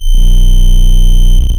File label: Raw Glitch